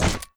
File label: heavy walk; garrysmod